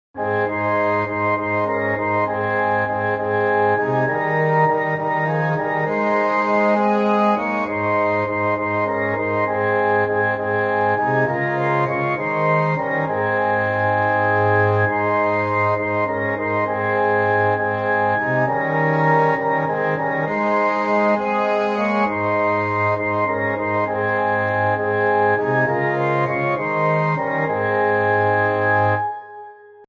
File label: Orchestra